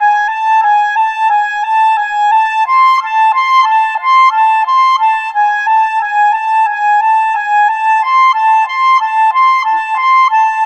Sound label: Clarinet, Cinematic; Sonic Foundry (Sony Creative Software); Orchestral Series VOL-3 - Cinematic